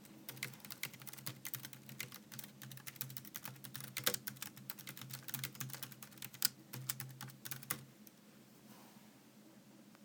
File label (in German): Tascam iM2 tippen iPhone